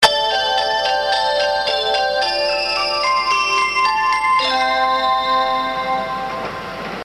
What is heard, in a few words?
train chime; はるか; ２８１系